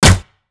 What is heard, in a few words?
Cross Bow Fire; Weapons